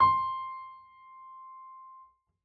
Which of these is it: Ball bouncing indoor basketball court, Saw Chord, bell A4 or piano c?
piano c